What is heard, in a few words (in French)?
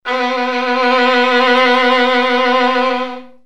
violon